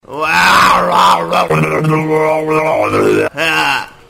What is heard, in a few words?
arghwa